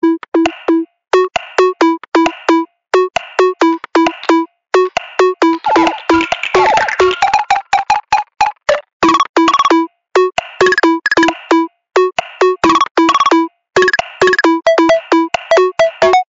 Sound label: ringtone; google pixel